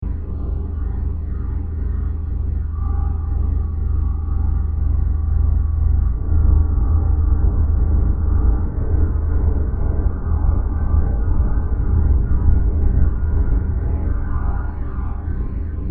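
Deep Space Ambience With Generator Hum And Other Noises, 120bpm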